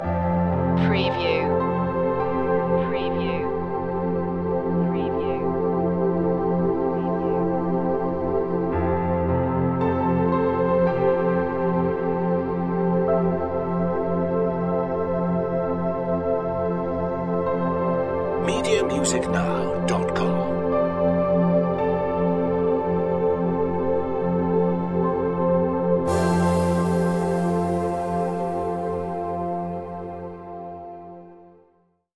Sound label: mystic piano